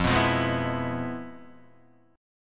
F Minor Major 7th Flat 5th, chord; FmM7b5 strummed; 1,2,3,1,0,0

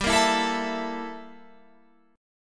G Minor 6th Add 9th, Guitar, Open F; Gm6add9; strummed

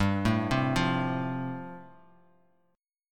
G 7th Suspended 2nd Suspended 4th, Bass Standard; G7sus2sus4 arpeggiated

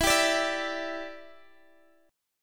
E Minor 7th, down, Guitar, Open G

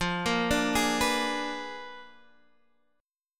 A sharp 7th Flat 9th F

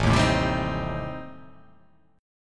A-Minor 7th Flat 5th-A-down, chord; Am7b5 strummed; JGuitar

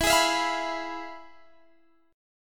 F Minor Major 7th Flat 5th, E

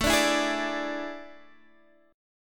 A Major 7th Suspended 4th, Guitar, Half Step Down; AM7sus4

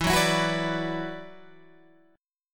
E 7th Suspended 2nd E down Guitar Open G

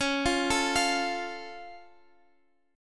F Augmented C sharp

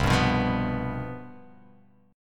C Major 7th Suspended 4th

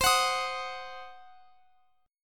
C Diminished C, Guitar Open F; Cdim strummed

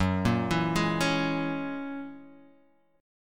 F sharp 9th, Guitar DADGAD; 4,1,2,1,4,x

